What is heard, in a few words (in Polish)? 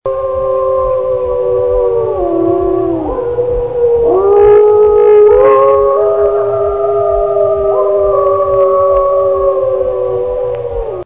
wilk